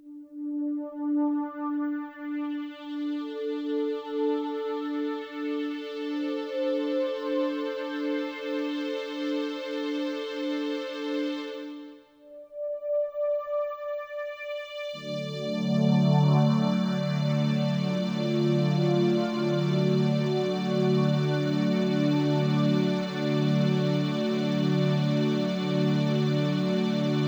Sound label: jingle; Ospiro